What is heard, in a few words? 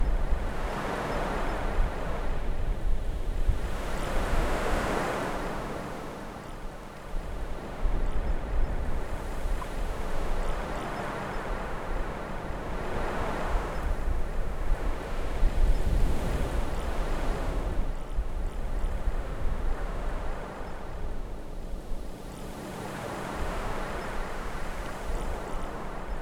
beach atmo light waves se